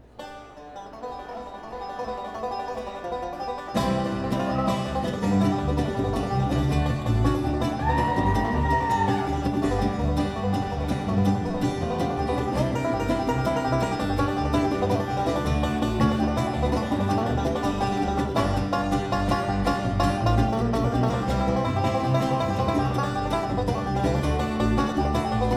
Instrumental; Bluegrass

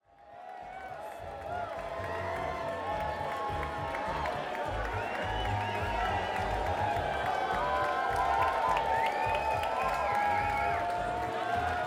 Crowd; schoeps

